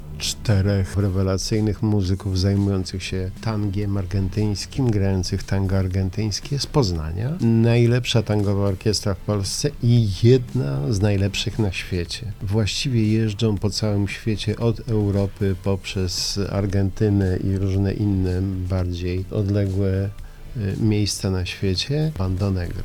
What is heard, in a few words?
Tango